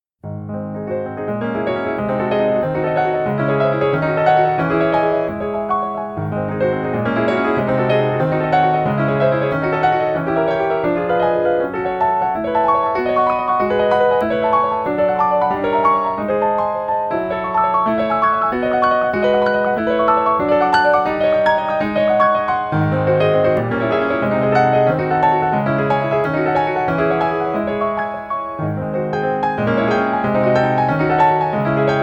C sharp minor; aperture no 5; weekly beats 2020

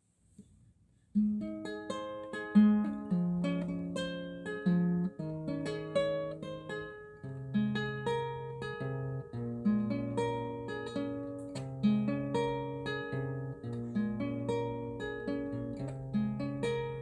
pretty chords; weeklybeats 2024

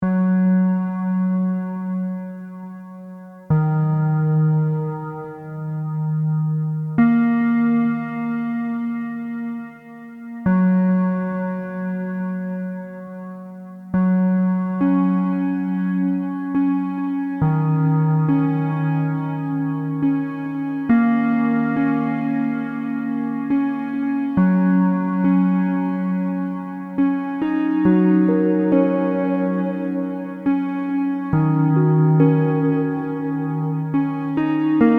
minimal meditation; weeklybeats 2026

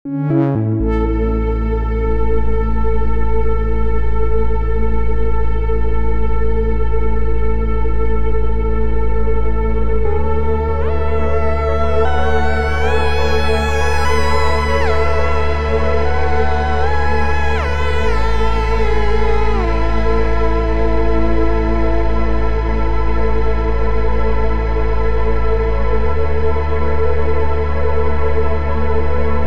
reverb freeze test

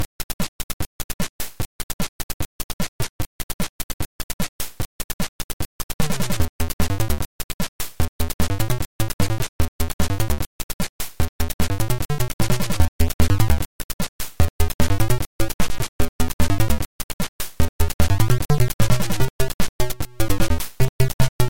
pulse wave nonsense ym2610 ssg only; weeklybeats 2024

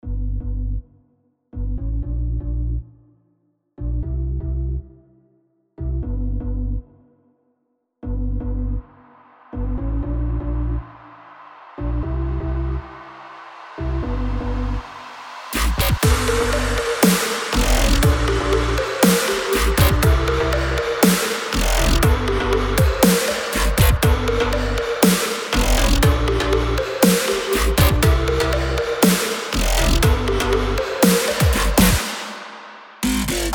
xmas beats

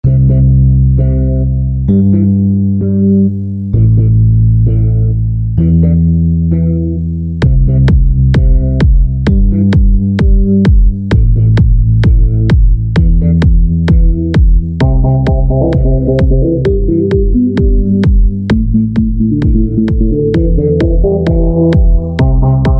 kick on coke

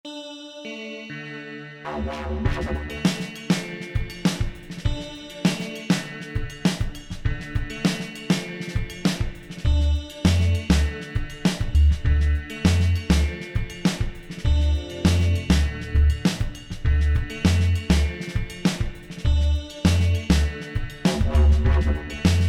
bellz n whistles; weeklybeats 2026

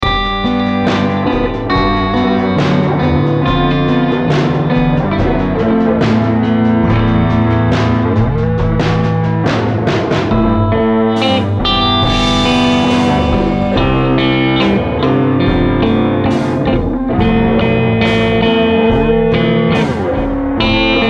guitars drums and fx; weeklybeats-2016

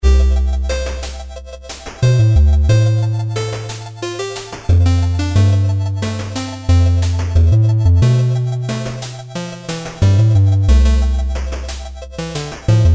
wobble; weeklybeats-2018

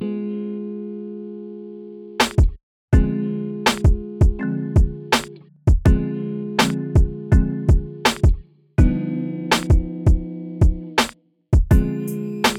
sad song

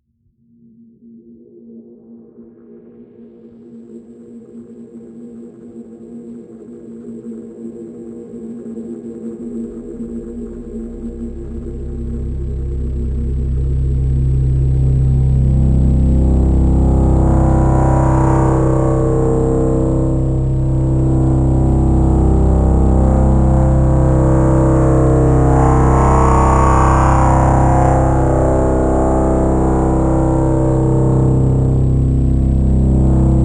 amiga drone; weeklybeats-2014

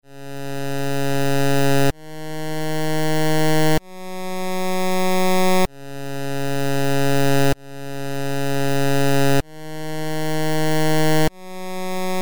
magical 8 bit plugin ditty; weeklybeats 2012

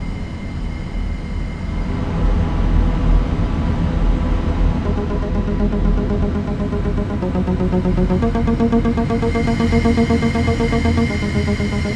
techno beat paul stretch with a dubstep beat; do they know its techno beat paul stretch with a dubstep beat over it; weeklybeats-2016

